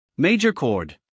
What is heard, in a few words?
major chord